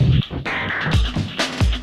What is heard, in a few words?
drums, Funk 131; F#min; Big Fish Audio; Hardcore Breakbeat Action; Funk Factory